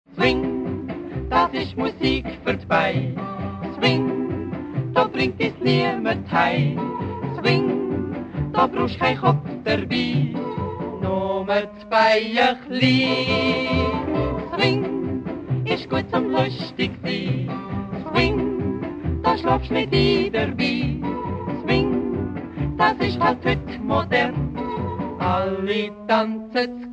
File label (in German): Swing das isch Musik fuerd Bei